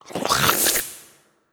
Skel Archer Slurp; Legend of Keepers

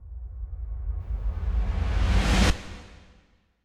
Intro Sweep

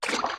Water Steps

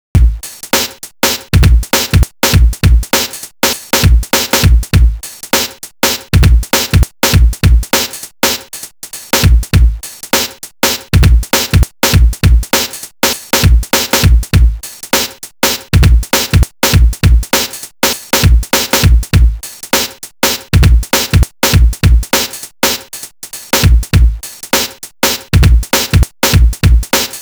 Loading Tune; Amiga

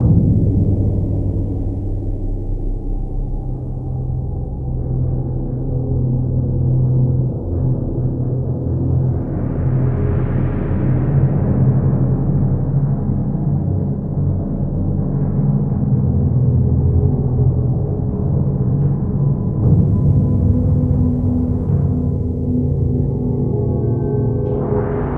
Cave

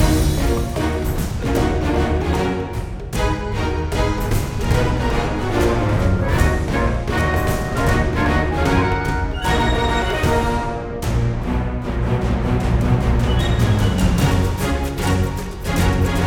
Music Battle Pirate

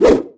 Sword

